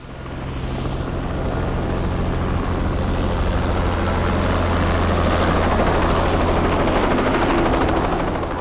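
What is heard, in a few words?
helicopter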